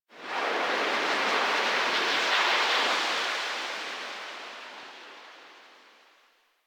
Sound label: Wind One Off, ambience; Warframe; Sacrifice; Vitruvian Memory